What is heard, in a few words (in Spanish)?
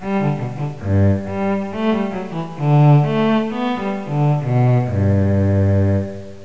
CELLO; Pipo-Aprende musica con Pipo